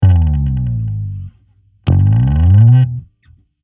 グリッサンド

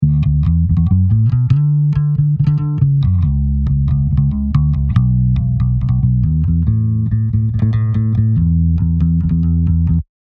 ピック比較 指

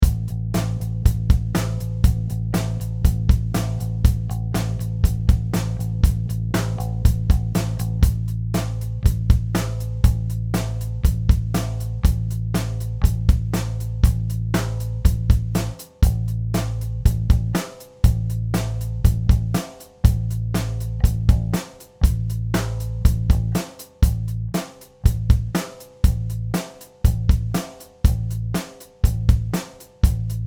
ベースリズムパターン表

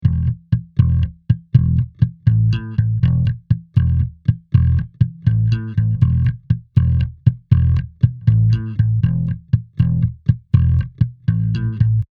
ベース ゴーストノート あり

